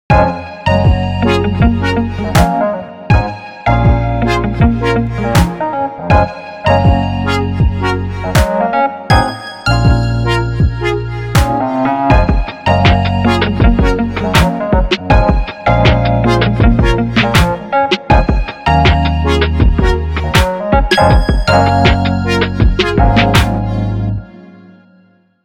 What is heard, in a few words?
Sparkle, ringtones; Samsung Galaxy M31